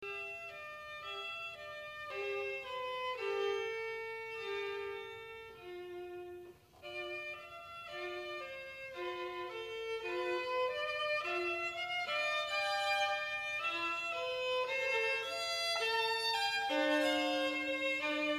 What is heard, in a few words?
violin